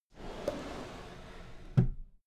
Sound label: drawer cabinet equipment close soft